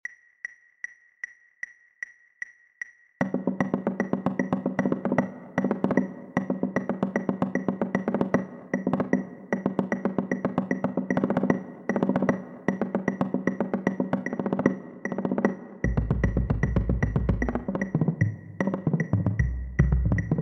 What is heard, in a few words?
sequence trip bass